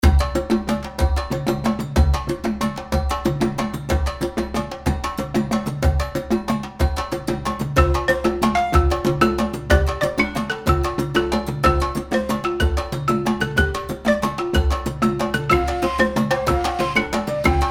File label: West Africa Mix